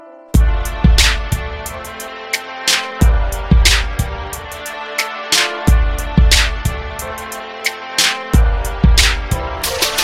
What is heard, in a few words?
hip hop dj